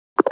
connection, Skype WiFi Phone; Netgear; SPH101

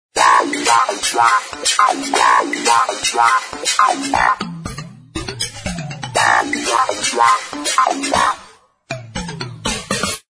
Wahwah; Simple

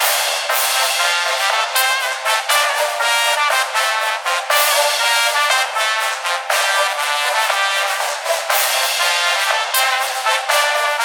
Marching Band

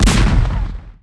cannon; miniBAE source code audio

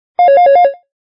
Melody, ringtones

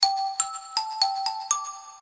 Xylo; Fero Royale-X1; ringtones

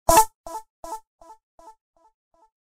Phaser

